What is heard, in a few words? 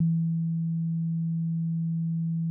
Sine Wave